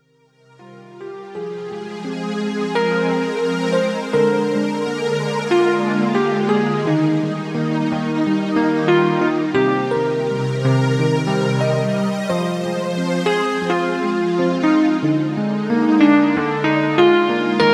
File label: Arpegiaturella; Musarmonie; axiel-son